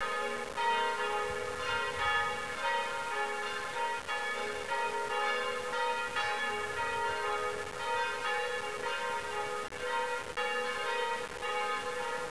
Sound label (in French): cloches